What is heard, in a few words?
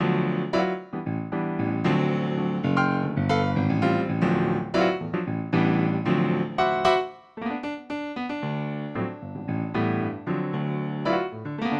Grand Piano